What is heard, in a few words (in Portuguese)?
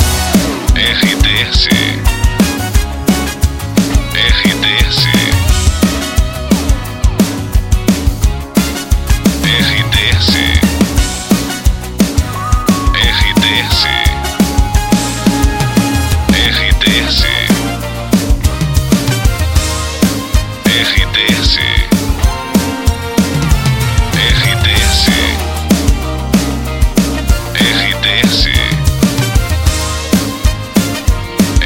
trilha para locução; Pacote Praia FM, Bertioga/São Paulo. Estação com características popular